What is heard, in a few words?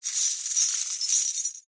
foley keys large bunch prison guard handling